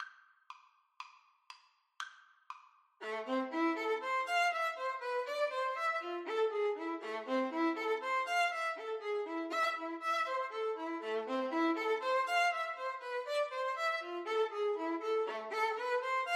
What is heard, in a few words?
violin duet